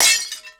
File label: tower break